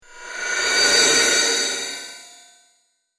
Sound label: Metallic Transition With Delays; Scary Soundscape; Horror; Crafted Signals